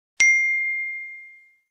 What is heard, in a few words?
whatsapp message